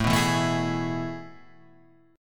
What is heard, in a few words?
A Major 7th Suspended 2nd, Guitar, Half Step Down